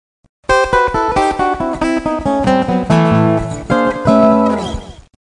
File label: acoustic blues